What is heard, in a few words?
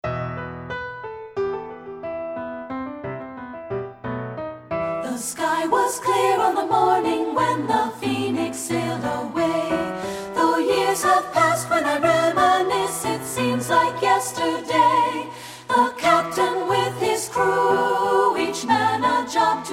VOCAL